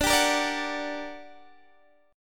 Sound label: G Minor Major 7th D